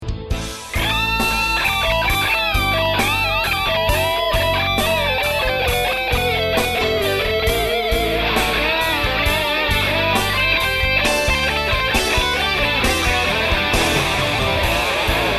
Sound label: Test Rocktron G100